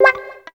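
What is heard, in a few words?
guitar; East West - Phat & Phunky CD2; 90's Sample CDs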